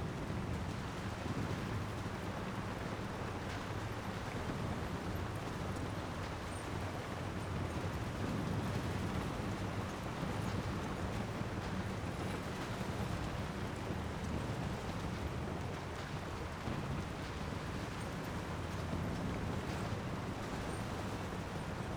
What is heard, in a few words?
distant battle; WAR